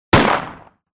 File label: gun